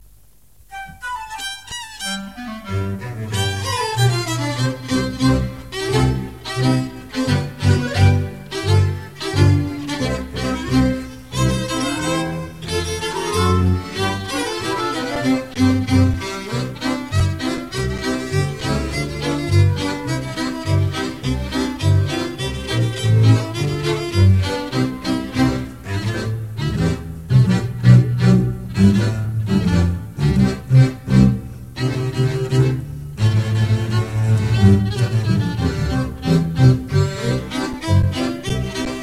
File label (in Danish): Fingerpolka, Danske folkedanse; Danske folkedanse II